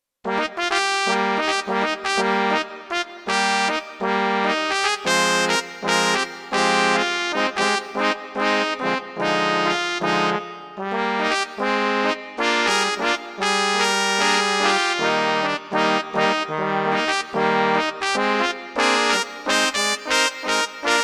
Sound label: Trumpet Fantasy